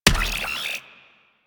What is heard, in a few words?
Drone Death; Warframe; Weapons; Archwing; Powers; Archwing Healer